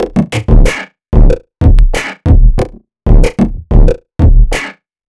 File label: Dirt Dry, Drum; Sonic Foundry (Sony Creative Software) - Future Retro Dance Excursions